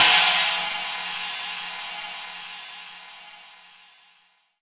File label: China Cym, Roland JV-2080